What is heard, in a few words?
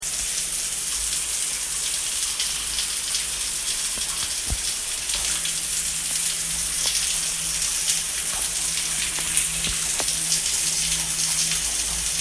Shower